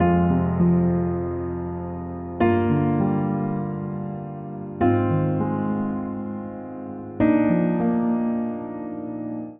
Progression i-IIsus4-III-IVadd4 F Dorian 100 bpm, Upright Piano; MIDI Clips; Earnest; Upright Piano by Spitfire Audio; Ableton; Factory Packs